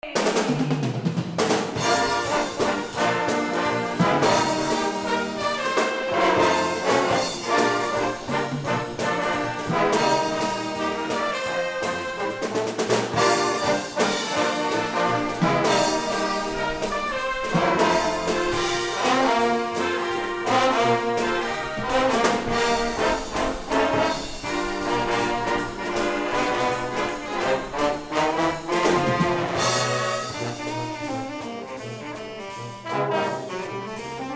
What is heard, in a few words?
Sax N Brass